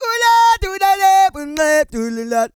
SING, World Voices, CARIBBEAN

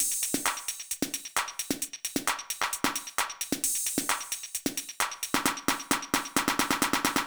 Beat, Old Skool House, 132bpm; Big Fish Audio; Marshmellow; Construction Kits

